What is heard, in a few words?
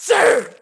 frost alf male hit; DAoC